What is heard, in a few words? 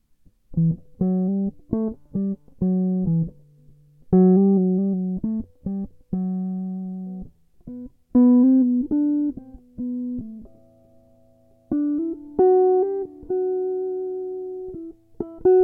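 Em 135 guitar loops solos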